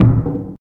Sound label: chest; scummvm; The Griffon Legend